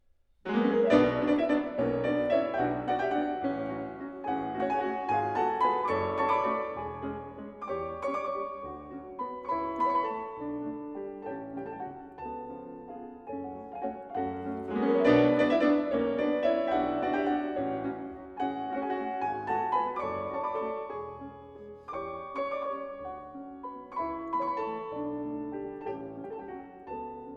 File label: Waltzes Version for 2 Pianos in B Minor; Martha Argerich